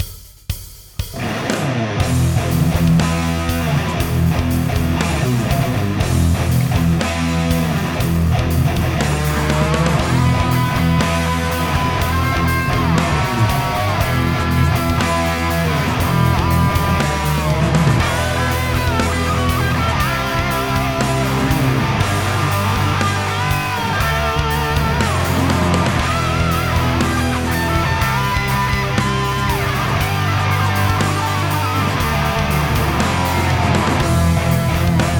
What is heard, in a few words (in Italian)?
tonex hi gain